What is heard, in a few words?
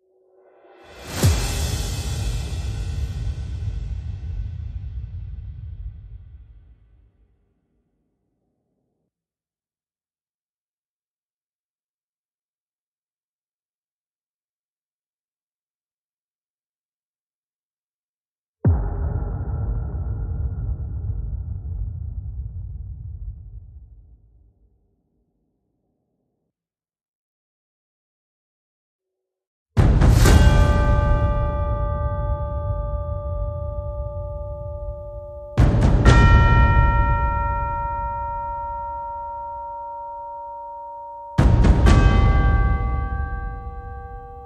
Drums